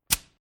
Industrial Air Tool Connector Coupling Air Release Short; Hisse Sound Effects